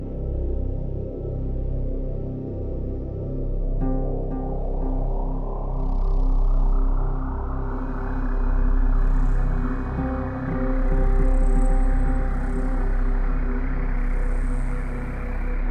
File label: ambient